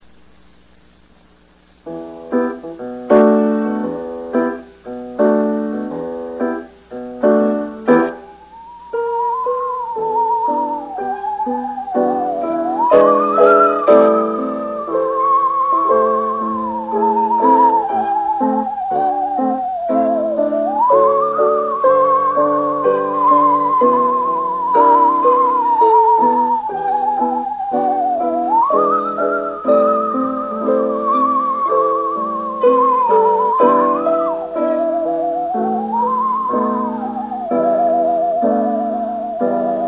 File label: saw; bizarni kramy